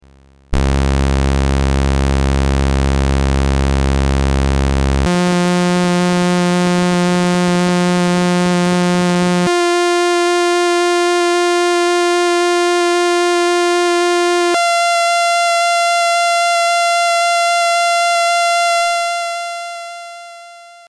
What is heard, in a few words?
ASR fast clock; cgs synth